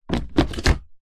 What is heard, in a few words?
tub plastic lid on; foley